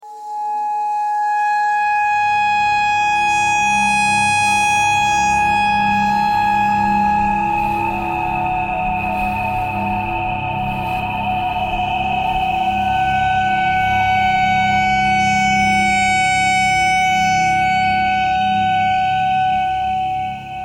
traffic kcore birds a clarinet notes e thread pentatonic; bowedmetal